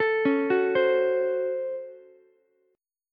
C sharp Major 7th Suspended 4th Sharp 5th C sharp, Ukulele; arpeggiated; chord